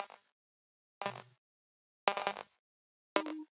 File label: Generic Click Digital; boom library; The Interface